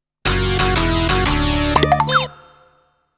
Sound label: Defeated's Theme; Lose & Draw